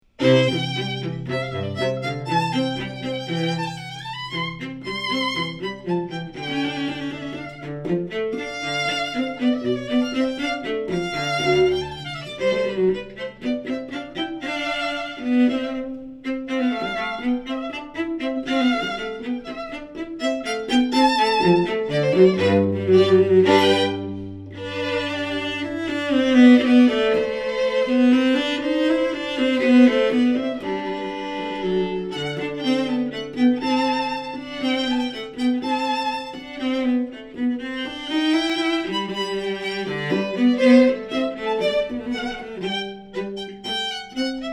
Duet for Violin and Cello; classical duo; Driftwood

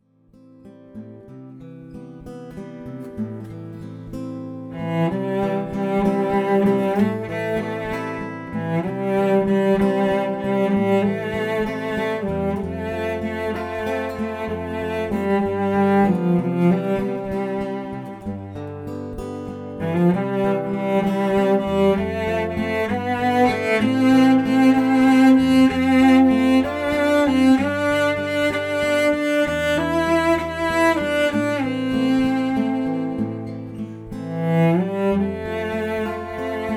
Cello Guitar Duo

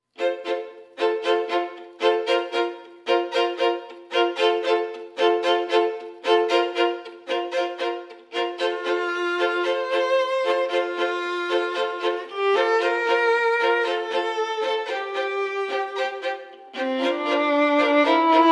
String Quartet

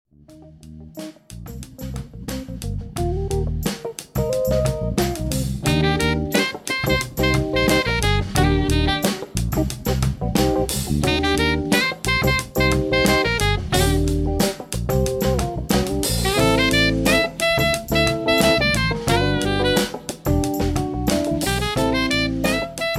smooth jazz

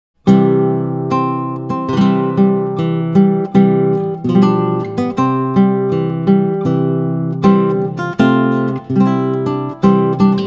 Flamenco; Bridal Chorus - Wedding March

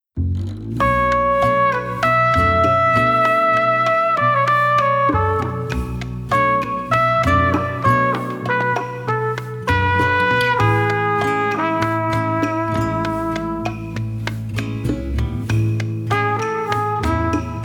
Bossamba; Latin

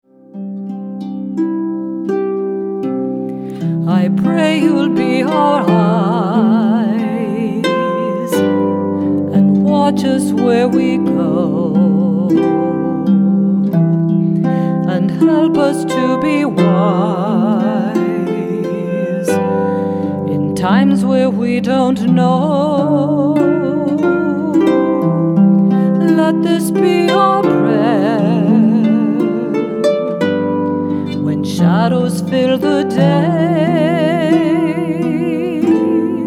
Vocal with Celtic harp

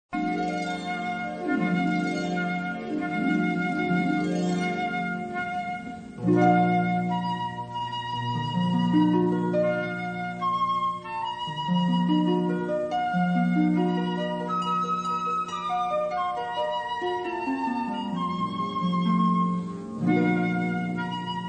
Bridal Chorus Wagner, flute harp duo

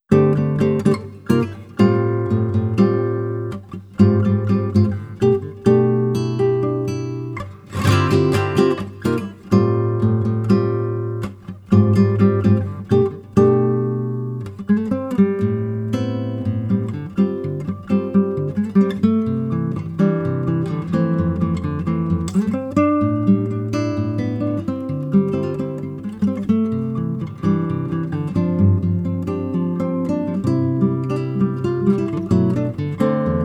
Classical

